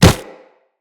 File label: Run Snow, Footsteps; Kubrow